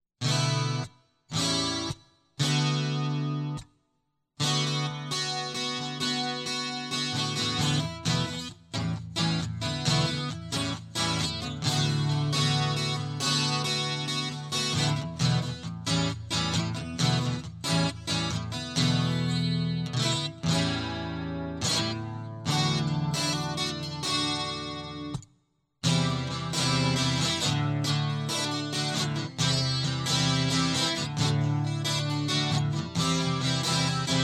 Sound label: Guitar Loop